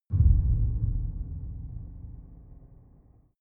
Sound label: far outer space shadow, hits impacts